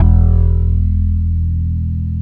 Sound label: UNDER BASS; Synclavier Sampler Library; KEYS AND GUITARS